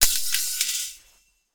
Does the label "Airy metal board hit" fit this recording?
Yes